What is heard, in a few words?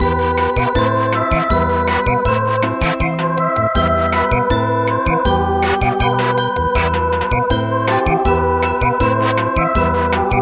Samba; CHUUGOKU CHIHOUNO KOMORIUTA